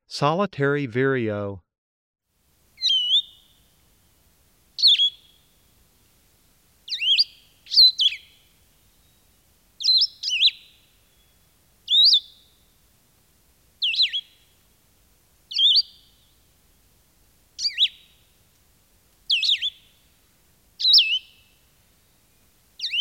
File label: solitary vireo